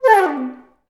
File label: FL FX, Advanced Orchestra; Best Service